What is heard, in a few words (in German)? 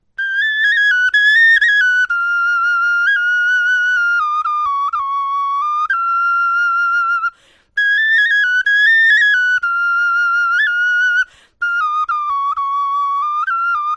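melodie, Knochenflöten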